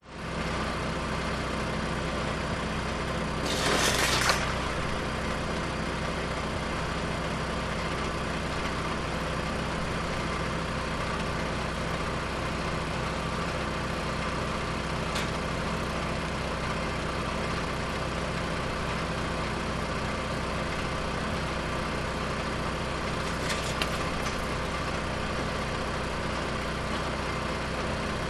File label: industrial woodchipper petrol motor engine operate branches close to fence